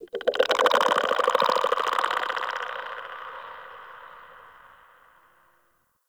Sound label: Infested Devourer Vocal; Warframe